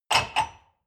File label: dishwasher cup grab